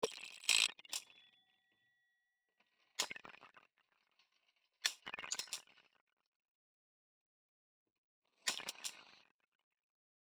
rhythm

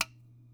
echo

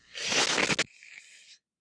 suck noise

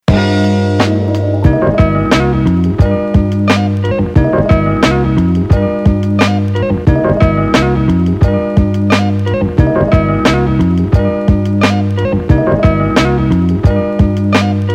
rhythm

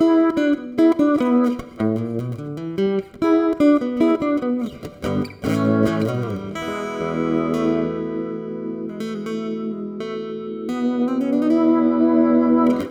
Vibrato, guitar

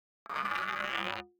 glass

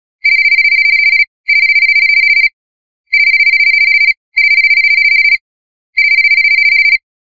cell

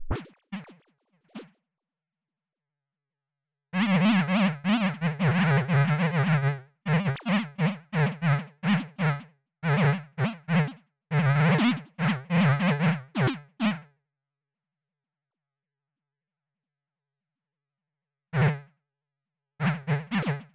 nature

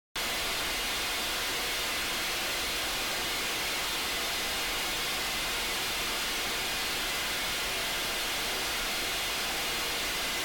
noise

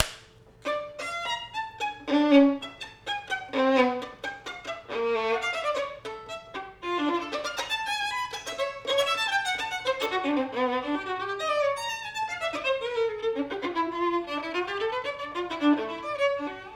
piece playful

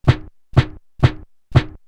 snare filt

